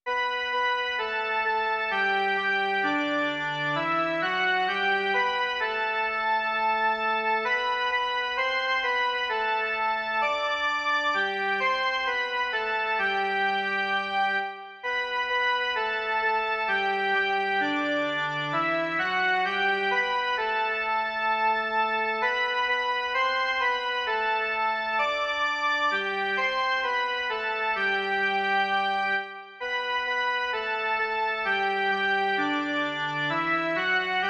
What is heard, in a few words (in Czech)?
jen melodie